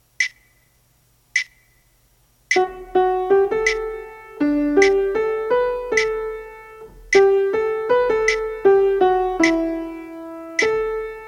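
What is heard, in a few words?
Refrain Melody, Choir Practice Tracks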